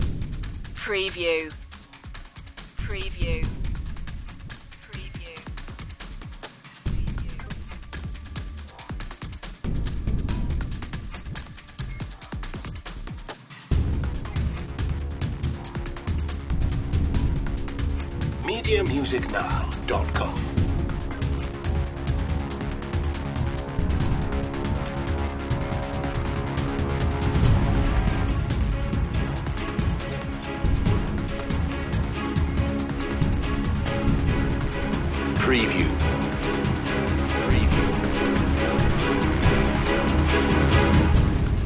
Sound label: nervous tension building